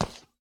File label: ceramic footstep; everness